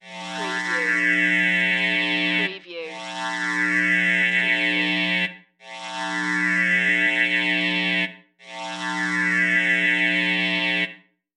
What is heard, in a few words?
Electric Swell Multiple